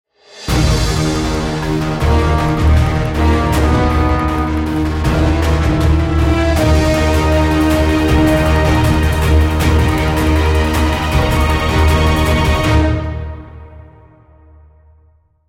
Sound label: start action, music; CS2; Counter Strike